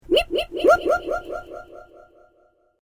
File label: Created Creature Small Ping, Alien Sound Effects